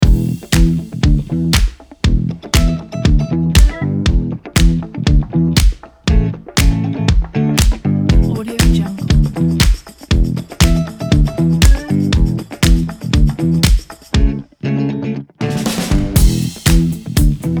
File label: fashion upbeat funk